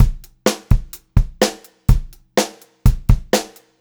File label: 126 Pop-Rock Drumloops